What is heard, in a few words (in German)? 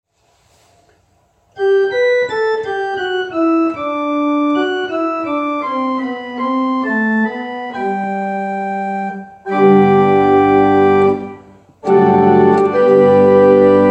Orgel; Breitungen; denkmaltag